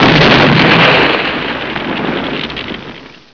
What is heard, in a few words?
Crash Big